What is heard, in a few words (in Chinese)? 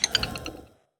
宝库停用声